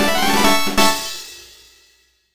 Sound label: Fanfare; Slide Adventure Mag Kid